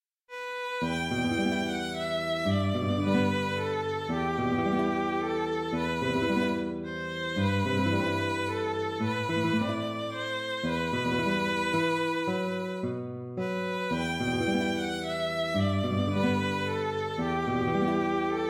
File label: violin guitar